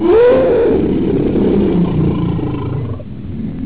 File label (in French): tigre; sons